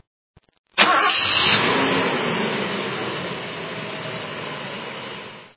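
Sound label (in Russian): легковой автомобиль завелся с автозапуска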